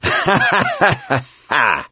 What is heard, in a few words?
laughter, gambler, survivor voice